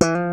Slap Bass

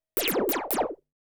SPACE FX 140; SpaceSounds

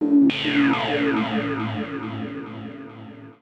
KEY SHOT TEKNO 140 A; Techno2; Singleshots

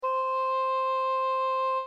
Fluyte, flutes; Real Samples

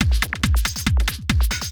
TECHNO BEATS 140

